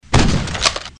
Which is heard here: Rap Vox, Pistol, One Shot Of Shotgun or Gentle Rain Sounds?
One Shot Of Shotgun